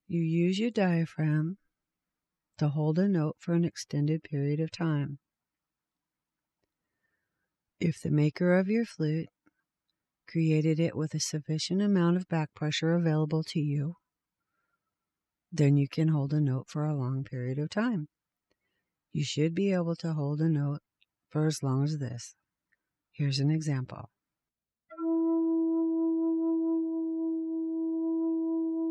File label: Hold A Note, Native American Flute